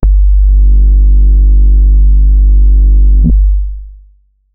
a powerful bass drop

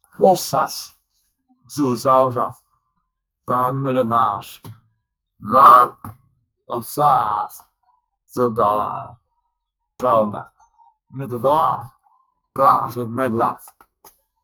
frequency

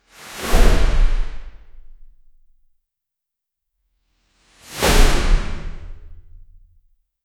huge fabric banner unfurl

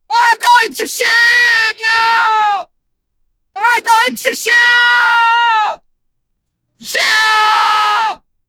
black rapper screams

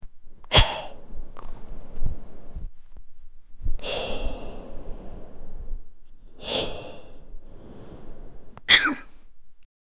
a grown men cough

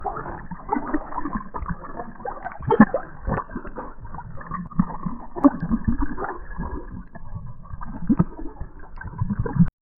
a shark swimming with a; cough

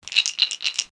pill bottle being shaken; household

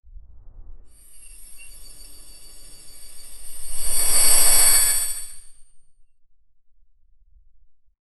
a metallic whoosh sound; mechanic

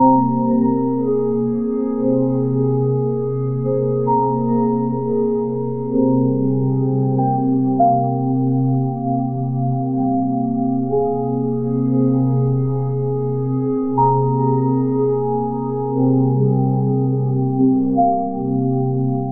style titl, melodic

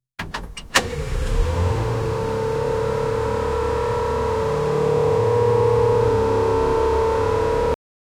bmw electric, car

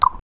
sipping

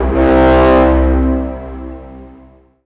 a deep resonant blast of; historical

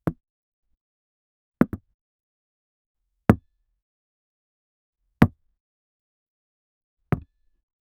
soft brush of tennis ball

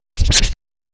dark quill scratch; document

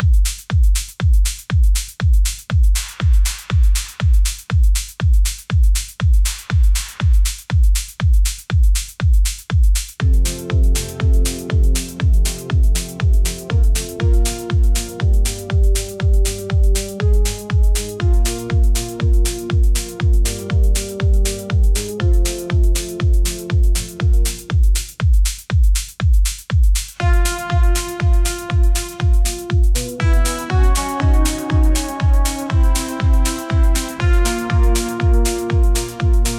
chill study beat with jaz

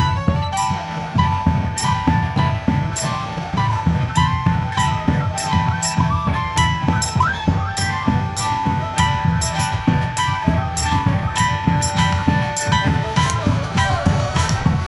upbeat lively hawaiian; hawaiian mu; danceable

